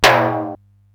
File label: SDS9 RM, Simmons SDS-9, Rimshots